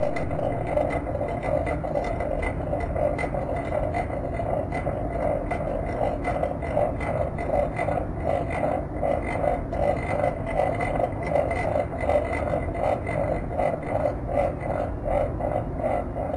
small fan

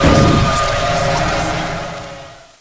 eerie flashback

